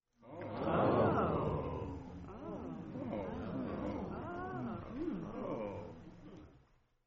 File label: Ohhh